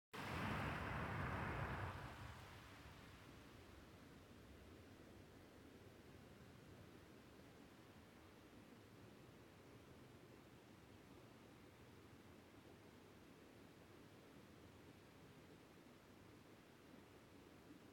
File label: falls sledding hill; berea